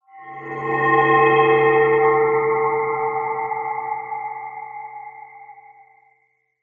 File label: horror